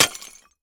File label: vase breaking